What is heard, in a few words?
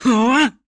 Male Attack Long; SandBox Musics